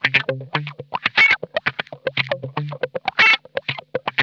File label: COARSE WAH, Funk Guitar; Zero G - Funk Guitar